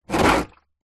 foley plastic water tank small drag ground water inside; zapsplat